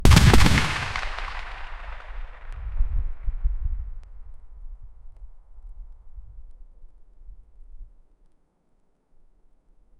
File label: big cannon firing one sho; landscape